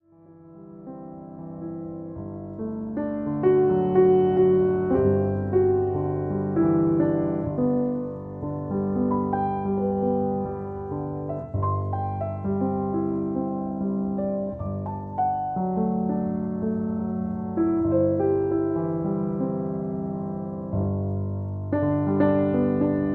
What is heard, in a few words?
Meditations on Acoustic Piano